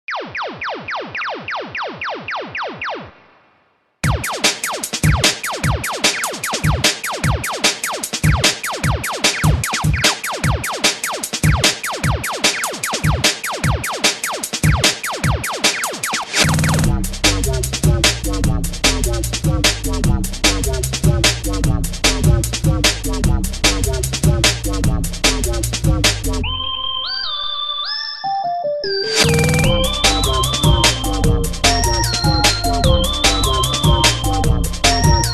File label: bass